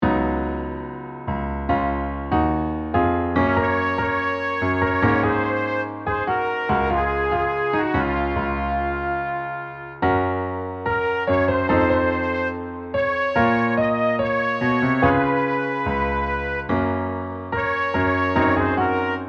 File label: piano melody